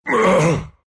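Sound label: pain, Russian; dota2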